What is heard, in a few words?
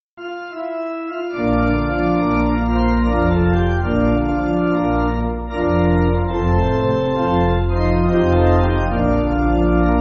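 Pipe, Pipe Organ, Backing Files for Churches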